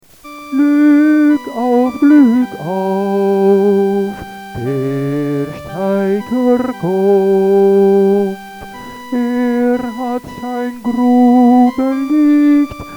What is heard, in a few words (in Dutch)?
herfstliederen gluck auf instrument en zang